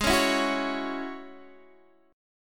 G sharp 7th Suspended 4th Sharp 5th G sharp, Guitar DADGAD